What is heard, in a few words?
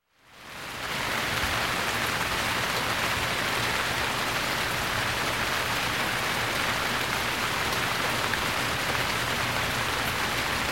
Rain, Medium On Pavement; Sound Ideas 2000 Series